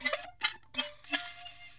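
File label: clarinet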